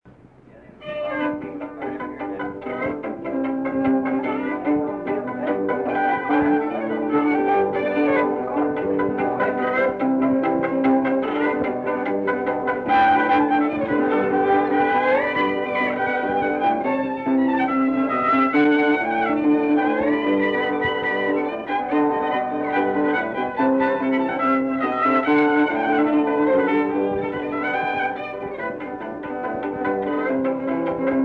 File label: Plucking